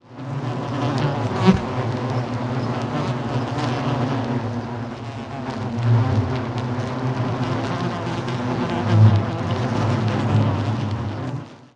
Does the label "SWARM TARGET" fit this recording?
Yes